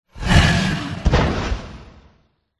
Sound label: dragon red death